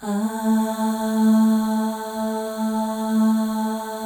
AHS, Symphony of Voices, FEM

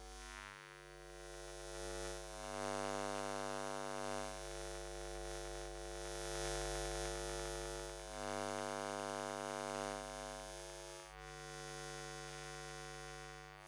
Electromagnetic Field, Electro; electric fields

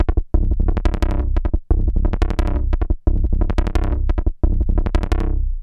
REZO TB, BASSLINES; Masterbits - Tekkno Trax

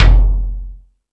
More Toms, Drum Machines

